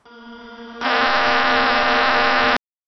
buzzer sound for a wrong a, gameplay

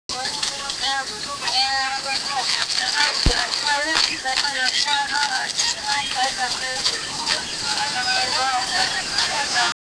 sound of a fruit

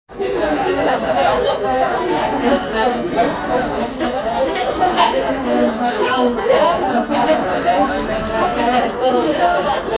restaurant busy hour

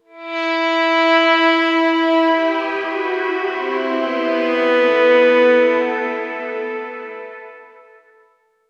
sad and emotional instrum

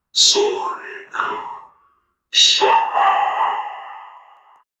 a devils walk in hell

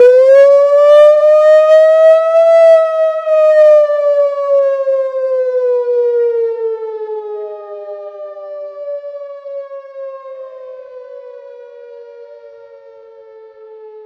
emergency

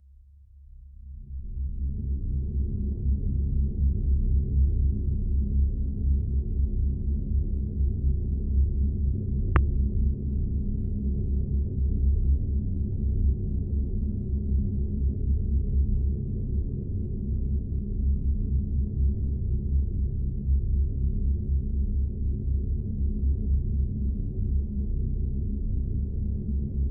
scifi spaceship deck inst; instruments

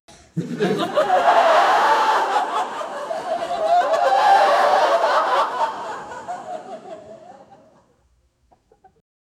the large audience laughs

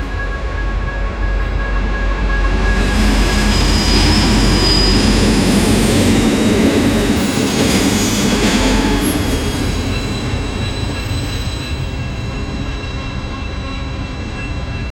train stopping violently, braking

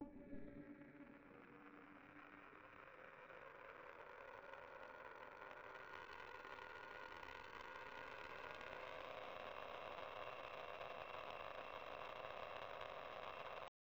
tv static; signal; outro sfx

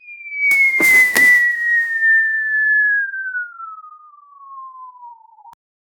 super realism the whistle, bomb

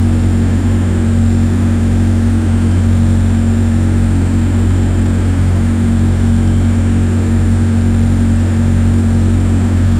loopable spacecraft main, sci-fi

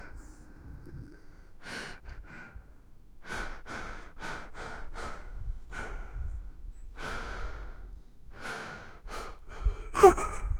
sniffling from crying mal

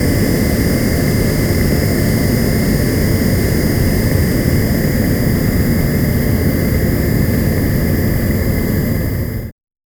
plasma torch flaming only

